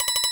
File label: big Win Count Up; netent